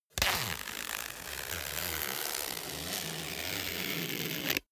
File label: zipper pullover jacket close slow; objekte; reissverschluss